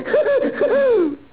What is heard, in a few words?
Daffy laughing